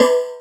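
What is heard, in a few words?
house snare drum; USB Soundscan vol.11; Drums Dance & Groove; AKAI; HOUSE KIT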